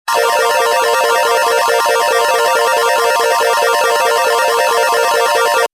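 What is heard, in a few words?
slot machine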